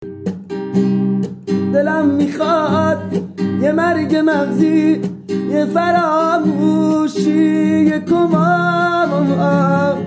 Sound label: Guitar